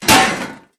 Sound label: Capsulte Explose Metal